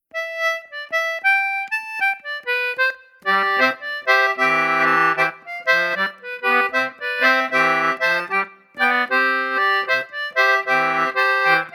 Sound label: bobbing joe intro; morris contemporary